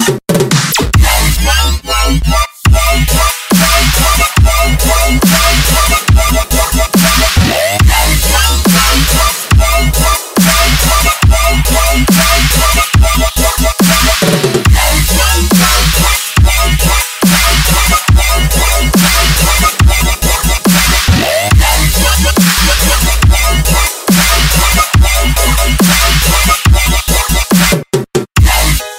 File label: 8 Bit Crush Ringtone